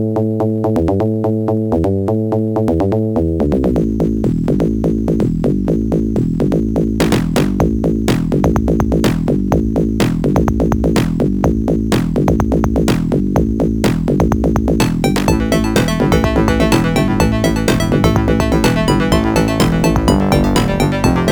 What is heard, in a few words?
fm ess